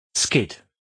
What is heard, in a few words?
skid